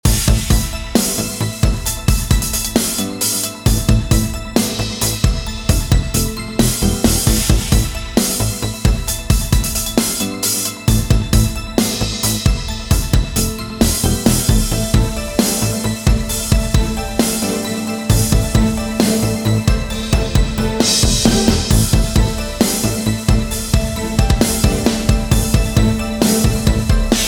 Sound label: Menu LOOP; Gunnheim